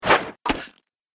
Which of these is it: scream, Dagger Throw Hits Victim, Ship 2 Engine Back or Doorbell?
Dagger Throw Hits Victim